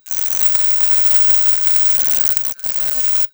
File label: drums